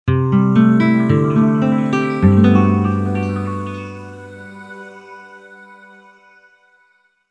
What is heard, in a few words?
Windy Nylon; V-Synth